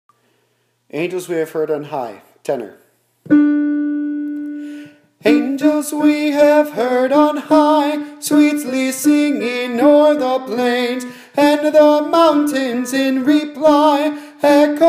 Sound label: tenor